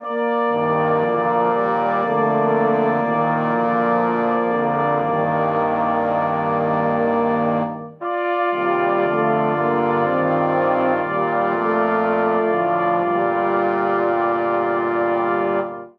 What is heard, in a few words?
Phrase G Minor 60 bpm, Brass Quartet; MIDI Clips; The Taste Of Victory; Ableton; Spitfire Audio